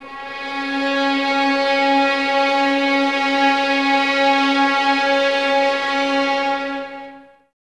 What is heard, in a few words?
VS C#4, String Ensembles, 11 Violins, Sul Ponte; Miroslav Vitous